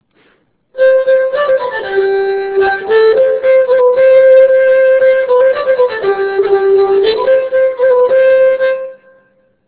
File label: treble viol; baltimore